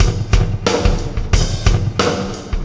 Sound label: Beat, Processed Drumkits